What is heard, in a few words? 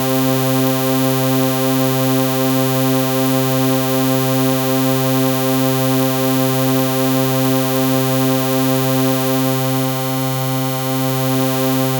B C3, Synth Line; 90 s Sample CDs; NiceBeats; SynthLine VOL-20